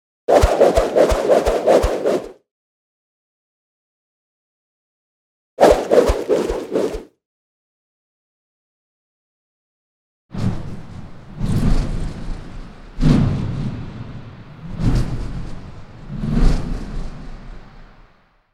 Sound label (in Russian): Verevkoi razmahivajut